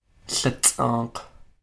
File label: Duck Moulting; Dictionary